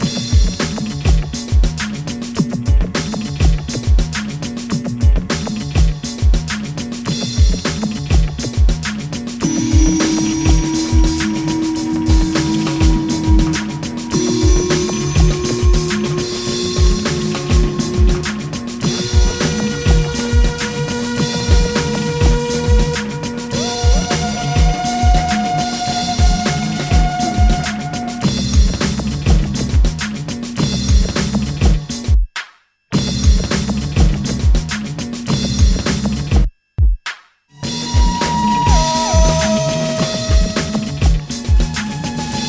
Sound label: disco